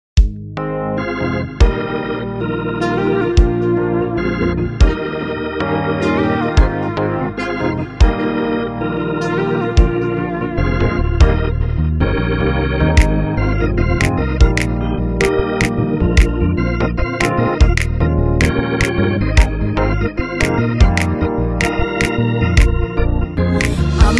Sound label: choir